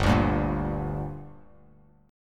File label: G Minor G; strummed; JGuitar